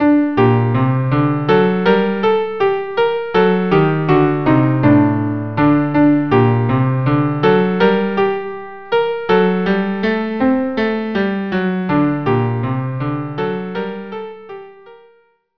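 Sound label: Los Bibilicos viola cello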